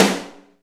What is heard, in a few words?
Snare, Alesis DM5